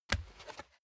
step barefoot wood plank single left; Footsteps; schritte

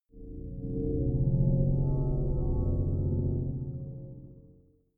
sci-fi saturn night, drones

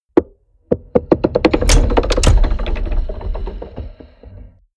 mechanics large rattle; industrie-wirtschaft; mechanik

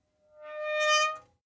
metal squeal short tonal

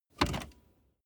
car bmw e46 interior seat adjustment lever short

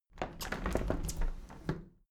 window handle plastic squeak open tilt soft; objekte; fenster

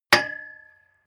metal impact pole high hard; hits explosionen; metall

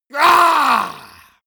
monster growl attack throaty; schreie

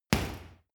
boxing punching bag hit hard; sport-freizeit; boxen

